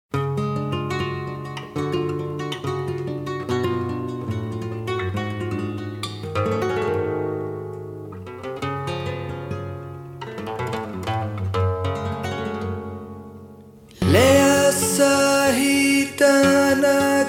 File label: Canto